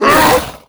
grunt hard, zombie vox; creatures

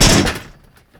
land metal grate, footsteps; player

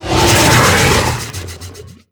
idle out, barnacle; Half Life Alyx

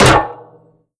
vent step, headcrab reviver, creatures

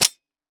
low ammo, weapons; Counter Strike Model Pack; CS:GO

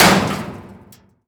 bullet metal barrel; physics

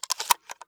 xm1014 insert shell; weapons; Counter Strike Model Pack; CS2

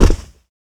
land carpet, footsteps; player

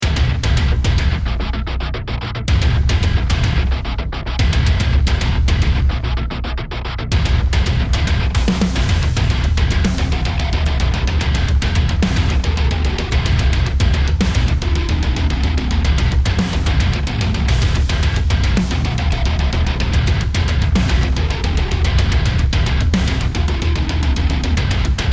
bomb planted

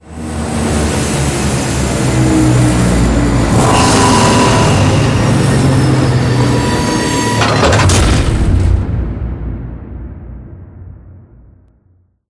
train arrival, combine train, mechanical units

